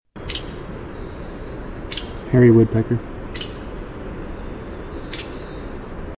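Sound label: peek, hairy woodpecker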